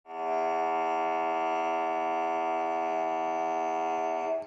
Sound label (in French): bon son didgeridoo